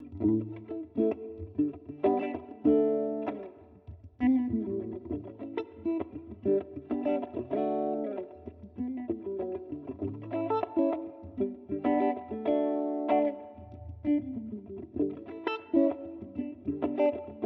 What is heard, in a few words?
Bass Mod